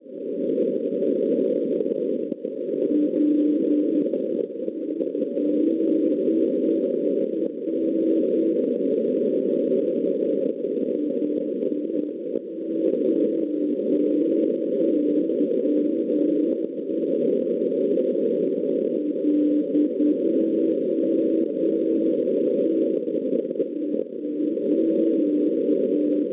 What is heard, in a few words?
NDB, 372 kHz; ODR; Kristianstad; Norvegia; Schwarzsee